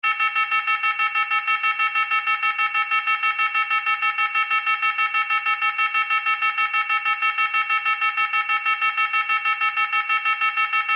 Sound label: Science Fiction Alarm Beeps; Alarm Sound Effects; Alarms (Sound Effects:Electronics); SFX Bible 6